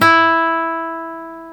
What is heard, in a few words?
GTR nylon